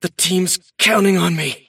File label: move pain; davion; dota